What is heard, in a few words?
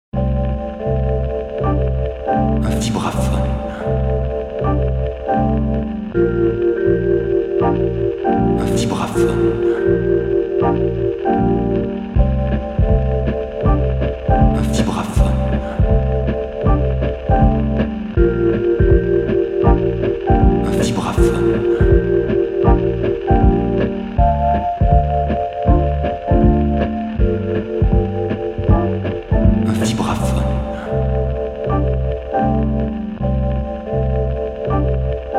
vibraphone; Black Market Audio